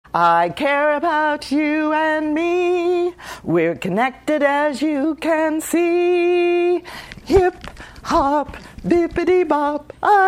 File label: hip hop